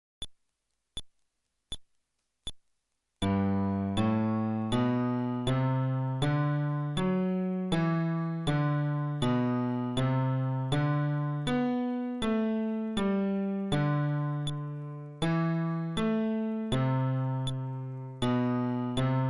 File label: Scale テンポ80, fretless bass